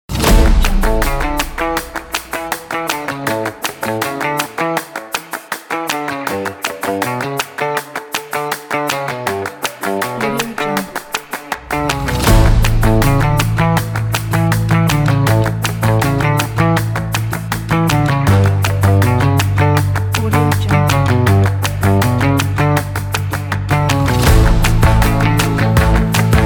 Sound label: motivation upbeat background funk